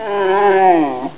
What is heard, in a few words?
Doom 2 Explosion